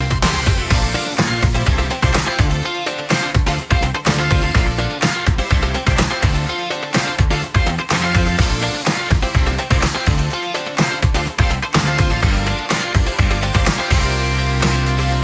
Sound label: loop